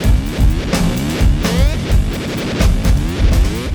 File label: Beat Loops; Zero-G; Monster Beats